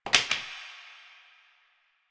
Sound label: Plastic Broom Drop; Warframe; Nineteen Ninety Nine